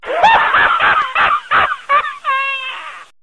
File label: laughter; Crazy Laughter